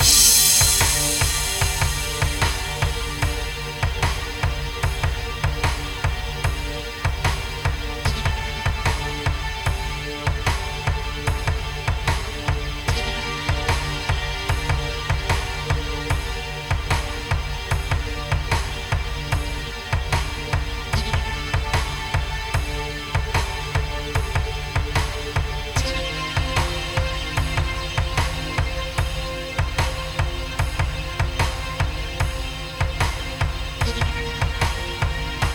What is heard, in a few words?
Suspense Doubt; Devil in the Details